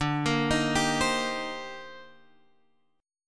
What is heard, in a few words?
Eb Major 13th D, guitar